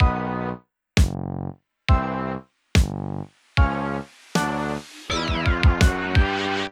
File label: 60 to 90bpm; Rhythm Hell